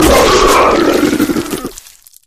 flesh destroyed large; flood

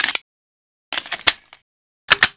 thompson reload; weapons

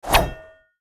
dagger swing; weapons

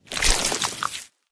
human form long move; flood

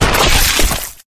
flesh destroyed medium; flood

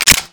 clip in, weapons

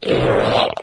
melee, flood

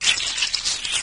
move, flood infection

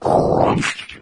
idle nocombat, flood

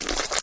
die; predatorcz; halo; flood; carrier

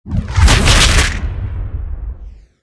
dragon melee hit

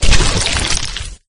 carrier walk; flood

